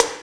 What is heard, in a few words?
SNR HI SWI, Snares; AMG; Now CD-ROM (Roland); NOW! Drums